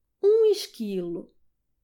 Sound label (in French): ecureuil